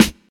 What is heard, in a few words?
short steel snare drum sample D key, steel snare drum sample tuned to the D note; snare drum samples